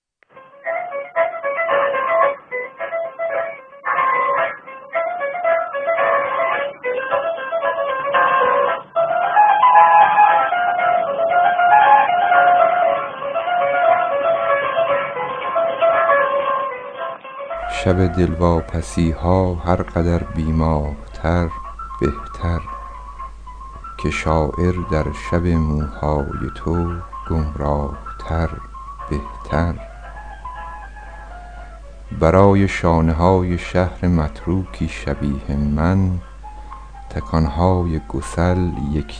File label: Piano